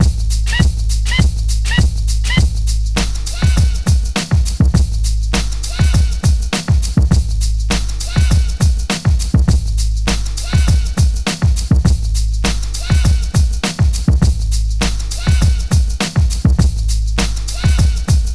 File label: 101.3 Heavy Squeak, Breaks; Lockdown Breaks